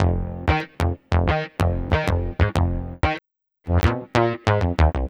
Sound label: Snappy, Bass; Sonic Foundry (Sony Creative Software); Synchro Funk